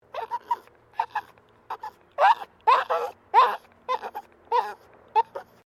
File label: Dog Maltese Terrier Distressed Whine, Small dog in distressed state; Dogs; Animals